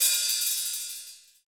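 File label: HH, hi-hat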